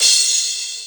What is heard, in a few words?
cymbal; E-MU Formula 4000 Series Vol. 1; Hip Hop Nation; Bonus Perk